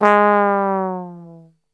G#1, Brass Super Section; Best Service; 90 s Sample CDs

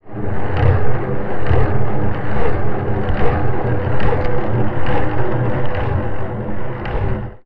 Marbles & Framedrum

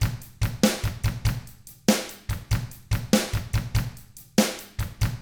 ROCK 6/8, Drums; ILIO; Double Platinum Drums 1

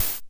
HH, HH Synth; Sound & Vision - Gigapack II; HiHat Synth

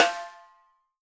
4X10 SOPRANO; Sampleheads; Peter Erskine Living Drums

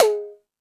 Dance 2000; E-MU Pro-Cussion

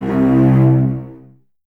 cellos C 2, String Ensembles, Detache; Miroslav Vitous

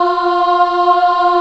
F3 child ah, choir; USB Soundscan vol.28; Acoustic & Synth; AKAI; CHILD AHF

